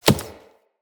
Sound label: Throwing Knives Impact Wood; Weapon Impacts; Environment